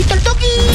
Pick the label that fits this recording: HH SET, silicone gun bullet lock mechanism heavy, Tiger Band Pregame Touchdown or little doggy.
little doggy